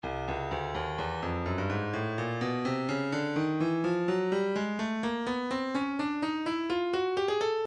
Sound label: breakbeat 063 bpm; Stylus Breakbeat Midi Files; seep a